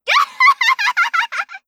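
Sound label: attack, Voice Line, Korean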